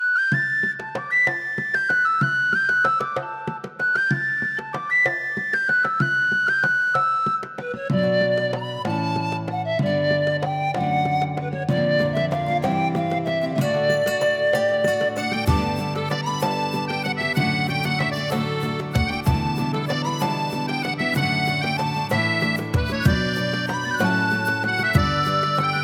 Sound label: Music Scene Start Loop